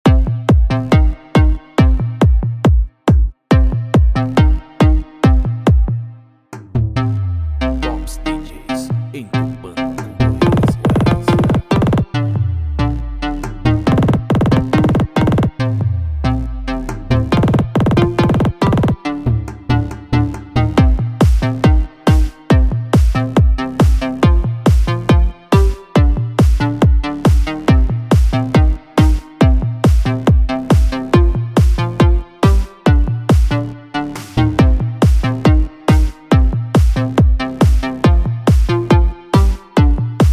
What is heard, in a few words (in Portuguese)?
paredão pancadão automotivo